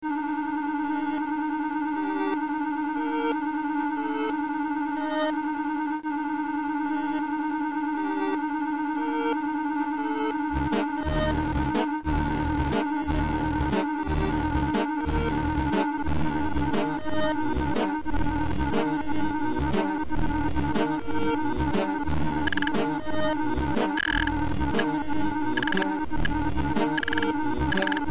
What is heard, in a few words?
Underground tunnels; Amiga